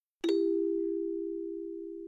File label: New Message